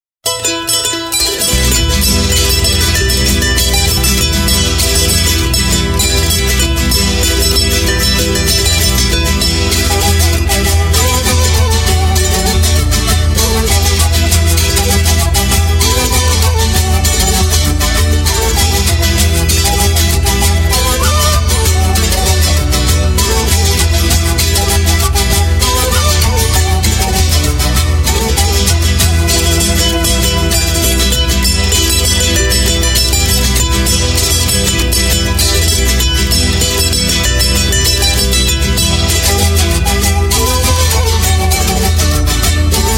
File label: singing bird